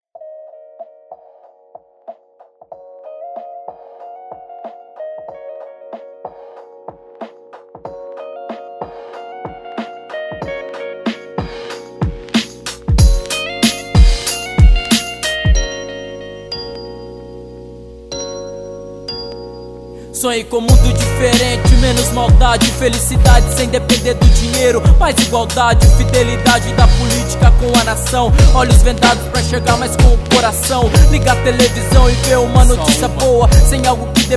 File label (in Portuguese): rap